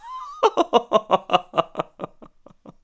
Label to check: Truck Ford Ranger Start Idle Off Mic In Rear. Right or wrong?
Wrong